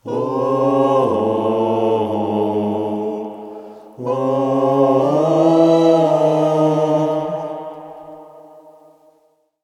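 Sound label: Monastery Phrase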